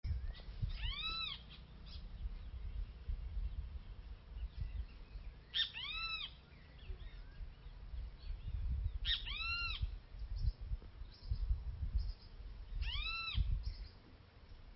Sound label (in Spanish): cristofue, aves